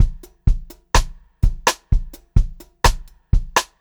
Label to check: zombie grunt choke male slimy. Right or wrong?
Wrong